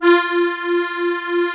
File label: STEIRI F 3, STEIRISCHE